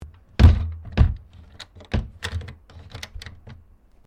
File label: Door Pivot Wooden Bookcase Close Key Lock; Various doors; Miscellaneous Doors